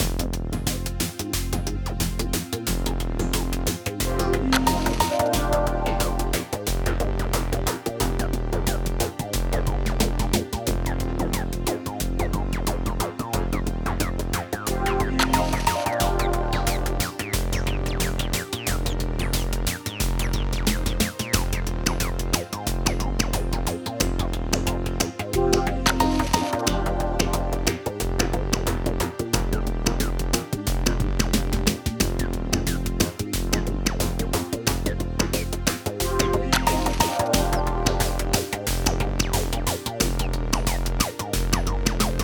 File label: ambient